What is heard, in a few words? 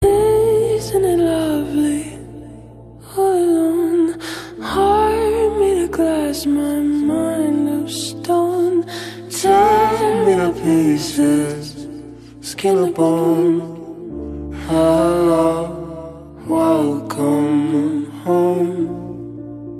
Ringtone Singer Khareji